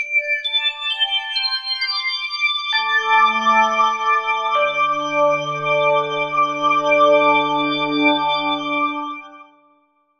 BELL FALL; eShop

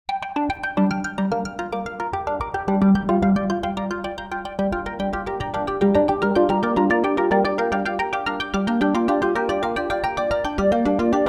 Arpeggiator

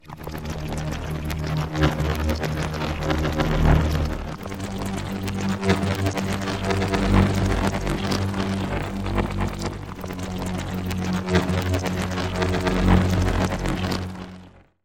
ALIEN TUBE DRIP; eShop

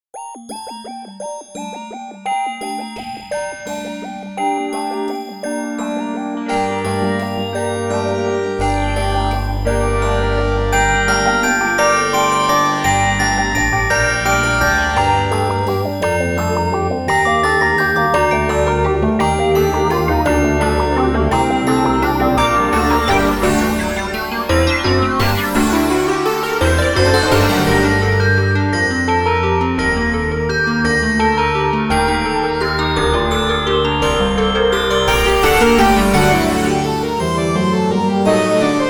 Carol Of The Bells

